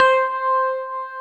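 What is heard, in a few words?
C4 electric piano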